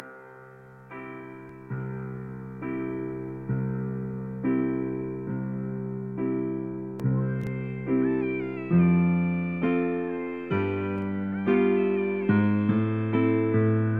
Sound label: sirens